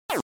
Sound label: Arcade General Pitch